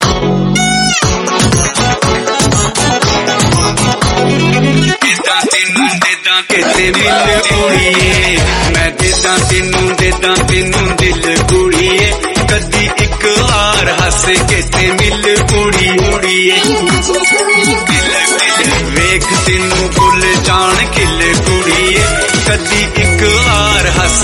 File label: hindi song ringtone